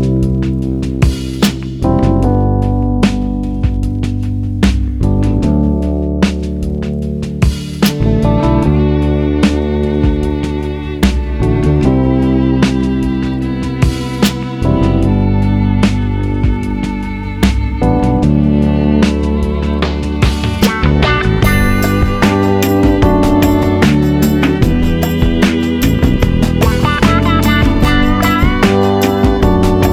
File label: Instrumental Version